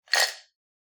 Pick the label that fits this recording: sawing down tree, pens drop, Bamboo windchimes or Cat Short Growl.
pens drop